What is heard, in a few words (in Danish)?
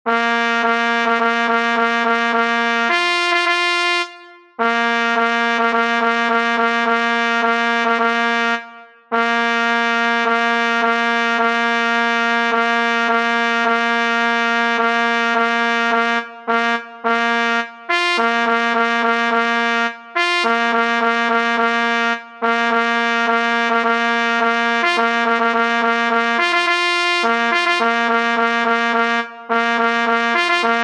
fanfare parforce